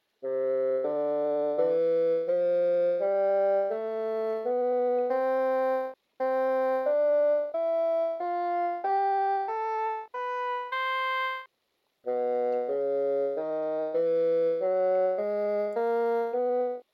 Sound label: Basic Bassoon Sound